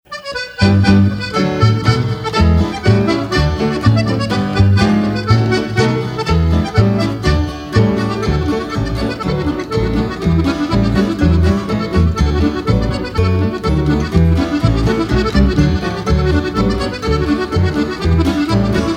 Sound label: Champagne Polka; pure tradition